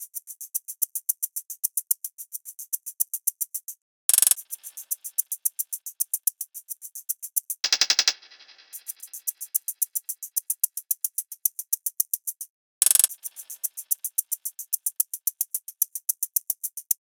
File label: loop hat 110; Seismic Loops